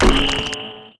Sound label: MUSIC STONE